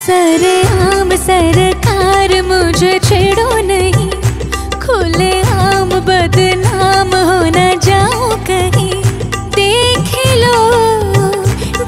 Ringtone

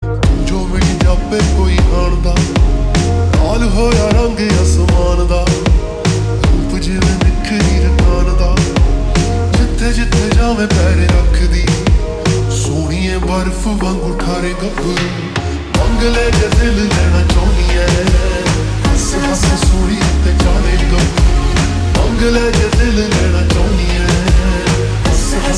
Slowed Reverb

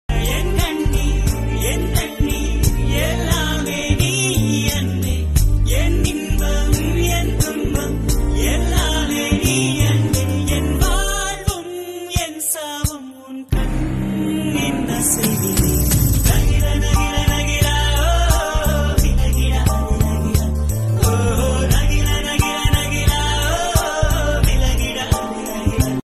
Ringtone; tamil; Madraskaaran

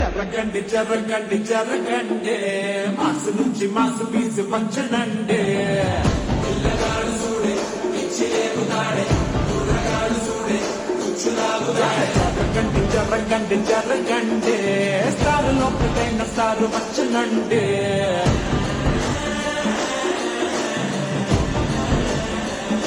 Ringtone Slowed Reverb

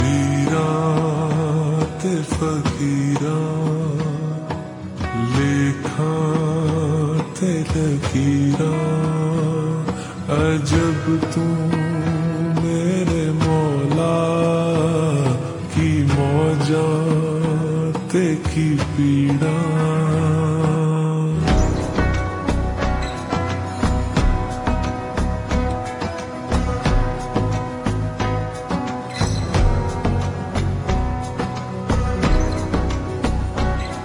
Slowed Reverb

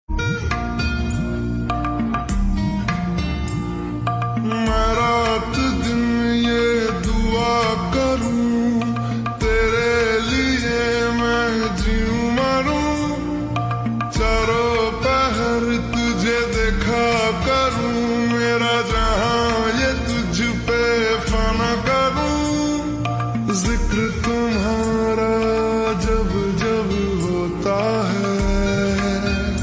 Slowed Reverb

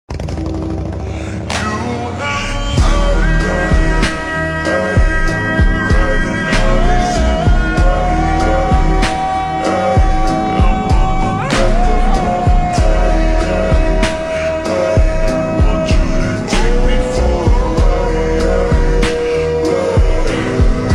Slowed Reverb

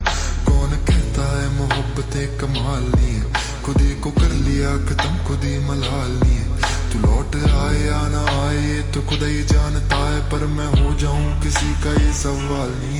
Slowed Reverb

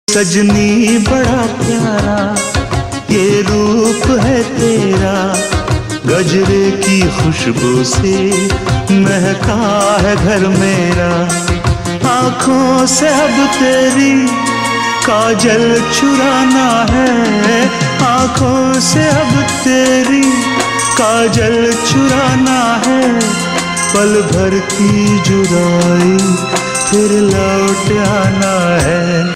Ringtone

